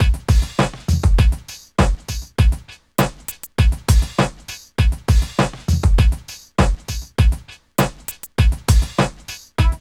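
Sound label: DRUM LOOP-L; East West - Phat & Phunky CD1; 90's Sample CDs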